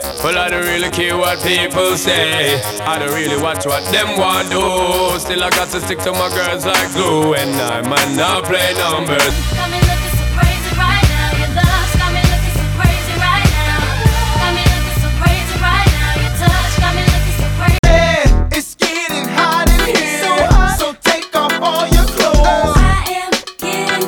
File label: RnB